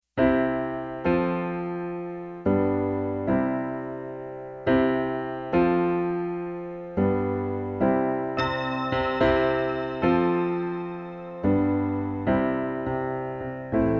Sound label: Piano; Mobi-hybrid Musician Templates